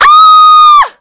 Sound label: falling